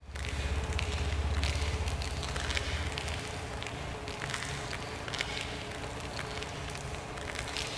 vehicle reverse; gtav; vehicles

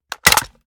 ar akilo47 reload empty fast mag hit; weapons; viper

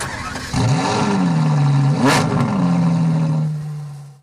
startup, vehicles; ferrari laferrari

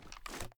reload empty swing, sh; gmod; wfoly plr sh charlie725

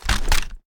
inspect

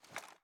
ads down, reloads; gmod

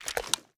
reload empty xmag start; gmod

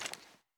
ads down; gmod

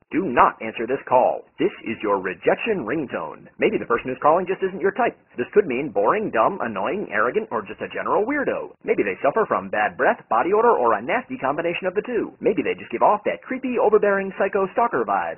rejection ringtone; gmod; perp2.5

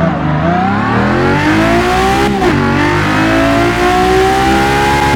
first, vehicles; ferrari ff

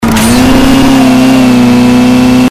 turbo, vehicles

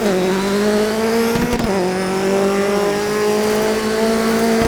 third, vehicles

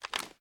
raise first adjust, reloads; gmod

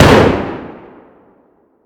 shotgun fire atmo int; gmod; viper

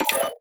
general purchase confirm; gmod; mw; user interface

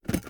ar drum reload magin; weapons; akilo47; viper

